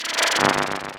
Glitched tones Transition; Afterbern Democralypse

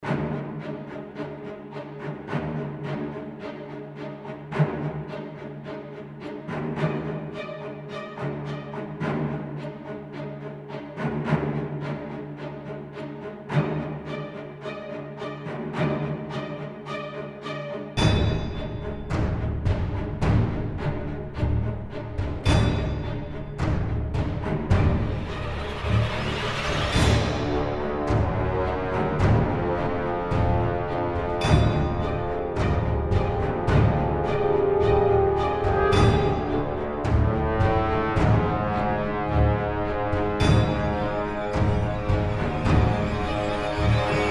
Aggressive Battle Loop with String Intro

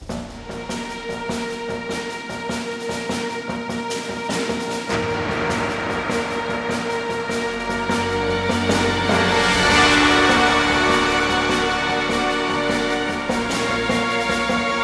Sicily Action

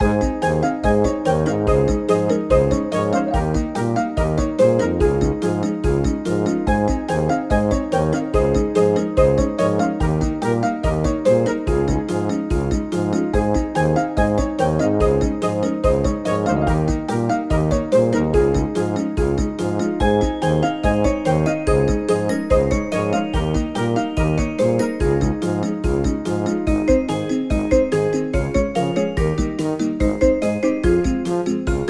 Character Creation; peppa pig world adventures

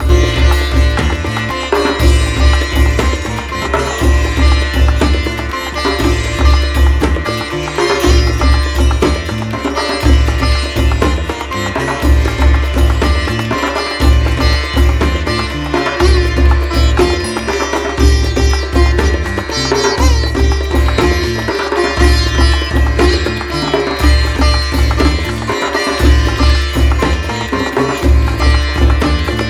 Combat loop; raji an ancient epic

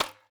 wood hit

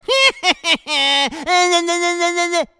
Character Minion Taunt; Angry Birds Epic

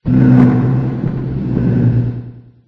Moan; Bonnie; Five Nights at Ronald's

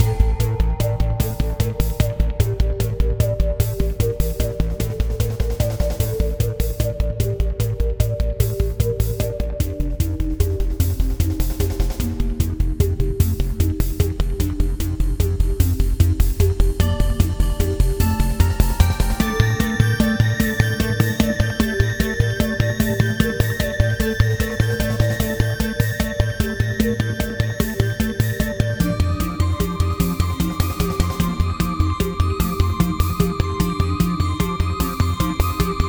Portal Boss; Ittle Dew

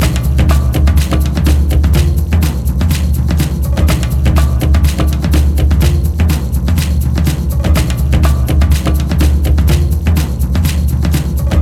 TENSION LOOPABLE

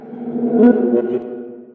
wound; chica; Five Nights at Ronald's

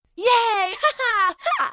game over Action; Talking Tom Gold Run; Becca

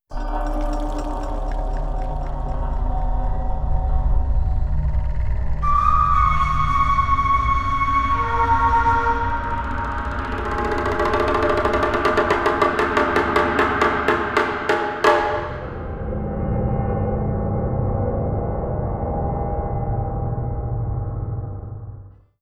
Outro; Zombie Island

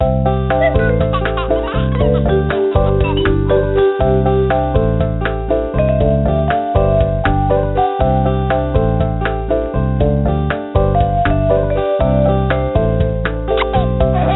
BGM Menu Main; Real Stories My Baby World; NDS

